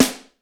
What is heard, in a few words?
SC SNARE, DrumBase, KITS; Crypton - DrumBase5000; BABY KITS